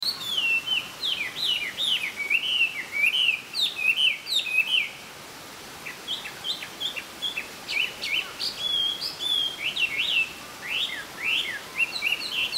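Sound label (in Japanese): gabi, 野鳥の声; ガビチョウ; 春になると麗しの大きな美声で鳴いているのを良く耳にできます。ウグイスとの協演のことも多くあります。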